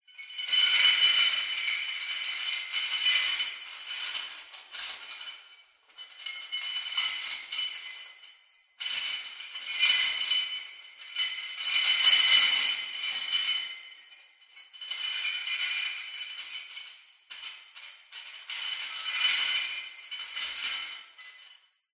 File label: horror chain shackles movement dungeon prison reverberant